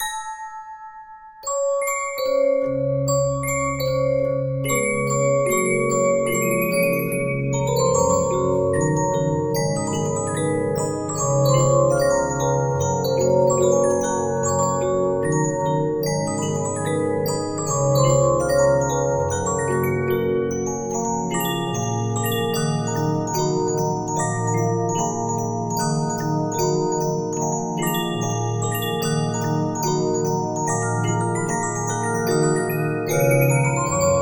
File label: Regina, 15inch disc musicbox